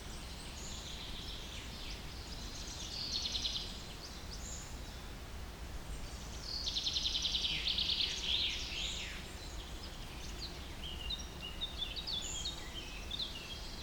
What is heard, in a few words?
Breeze In The Forest